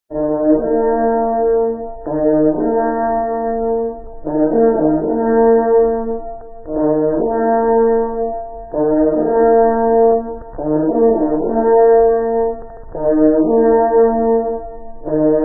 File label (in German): Sammeln der Treiber, Jagdhornblasen; Signale; Brauchtum